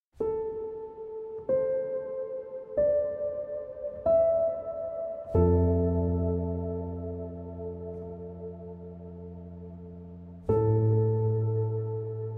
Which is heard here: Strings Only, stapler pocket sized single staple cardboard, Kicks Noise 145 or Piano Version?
Piano Version